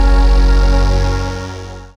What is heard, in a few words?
SYN DANCE; Sound & Vision - Gigapack II